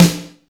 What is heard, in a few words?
snare sound e key